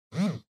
Cell Phone Vibration (Cushion)

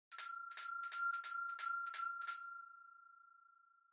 Winning Bell Far Strong

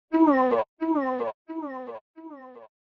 SNES Fighting Scream

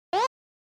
SNES Shooter Item

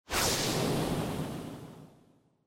Motion Speed Lines High Short

